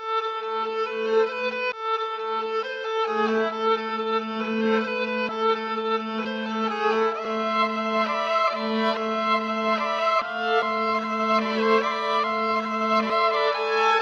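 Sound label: strings persistence, Sad Loops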